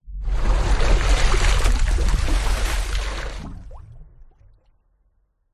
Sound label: water whoosh impacts movements; george gazetis